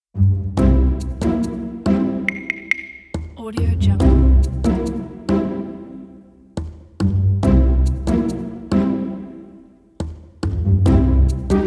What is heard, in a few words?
comedy pizzicato